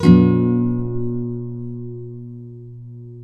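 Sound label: nylon up minor C, guitars, flam chords; Spectrasonics; Hans Zimmer Guitars VOL-2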